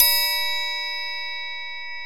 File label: triangle; E-MU Formula 4000 Series Vol. 5; Protozoa; Proteus2 Presets